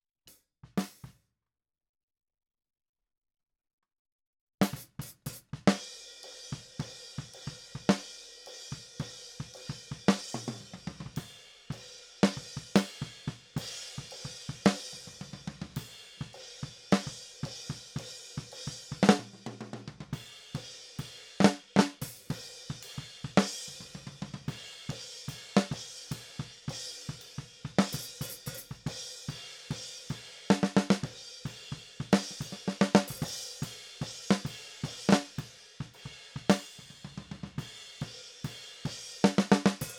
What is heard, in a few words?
Snare